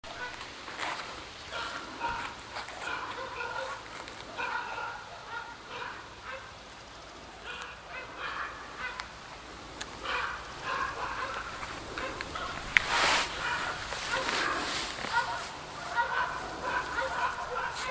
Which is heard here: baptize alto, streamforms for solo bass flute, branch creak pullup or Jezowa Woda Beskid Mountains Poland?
Jezowa Woda Beskid Mountains Poland